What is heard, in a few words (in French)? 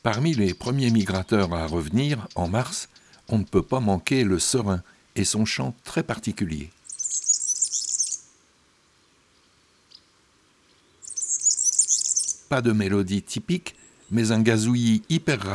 Le Serin cini; Les 4 saisons des chants d'oiseaux